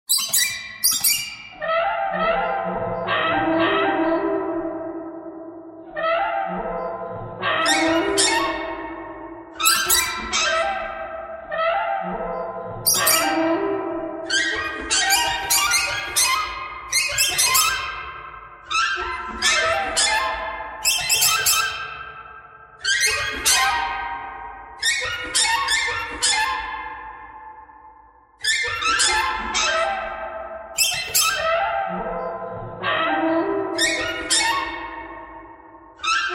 birds thread noise

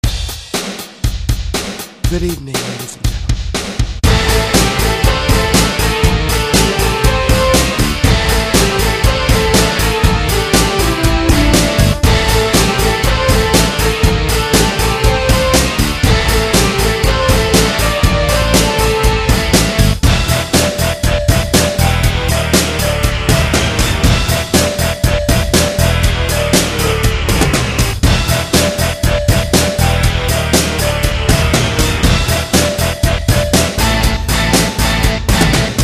xbass; 게임음악데모-ROCK

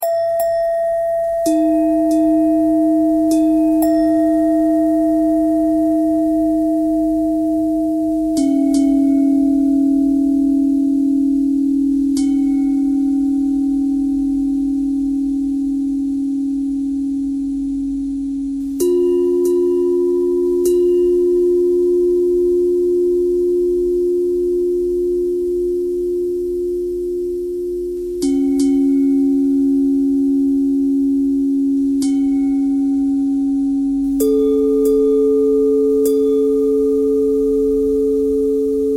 bell bell thread noise

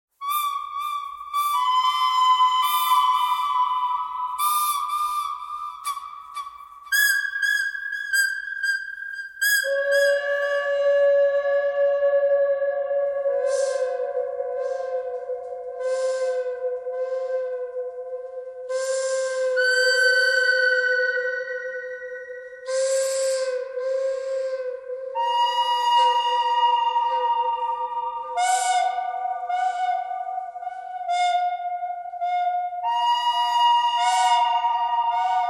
bird canyon thread harmonic; birds

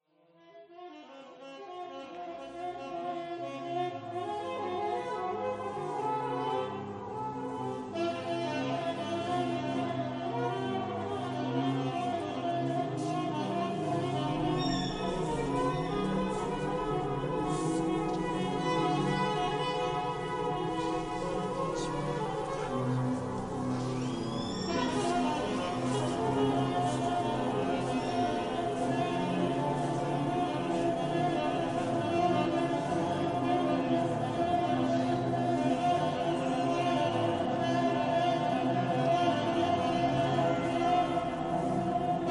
clarinet jazz thread noise; clarinet geese

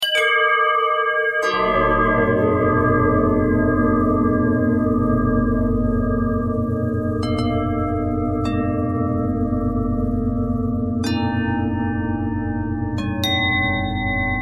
bell bendtwist

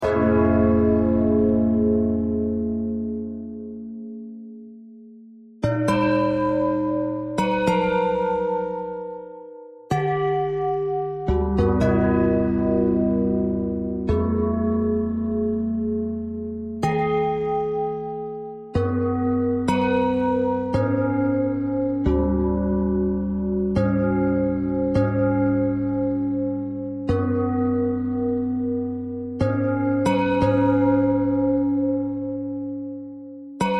bell thread noise

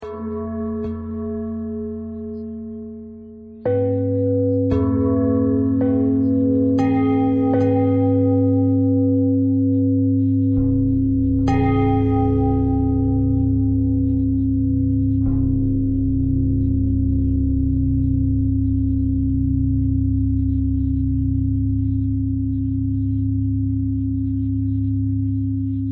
bell thread pentatonic

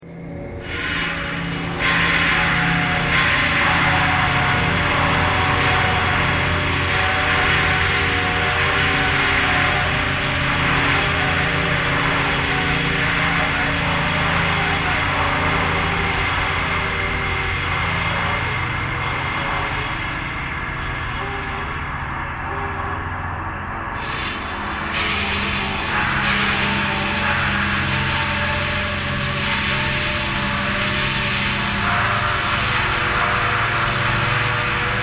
traffic core birds thread pentatonic

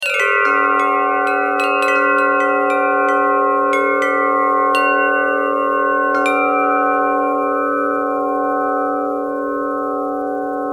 bell thread buzz, bells

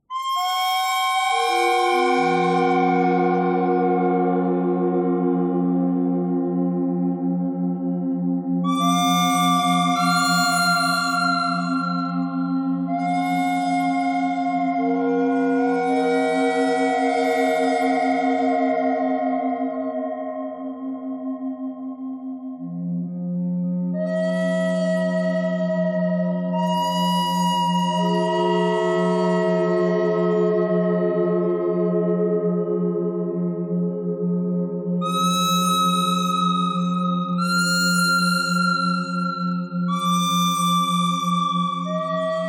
bird thread noise; noreverb

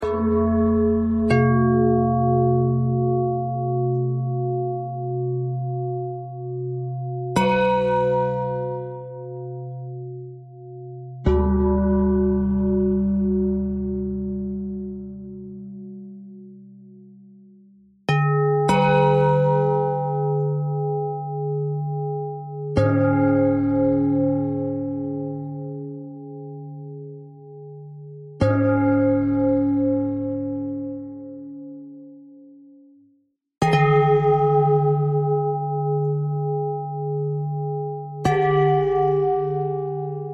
bell bell thread noise

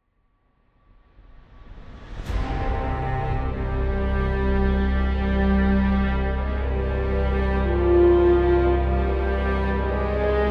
medieval cinematic

